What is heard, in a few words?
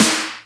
Snares; Vengeance - Essential Clubsounds Vol.4